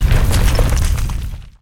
Animations Disappear Large

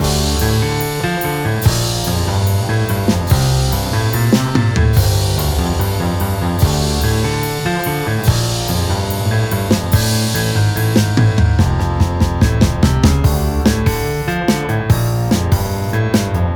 Punk Intro; gamerip; Affect Music; Passpartout The Starving Artist; Android, iOS, Linux, Windows

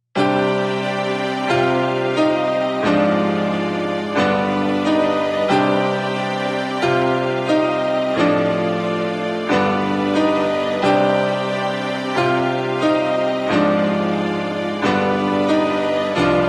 Terror; menherafflesia flowering abyss; android

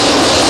Sfx Winter games

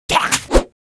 Goblin Attack

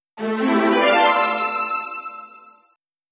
New Town Unlocked; Buildalot

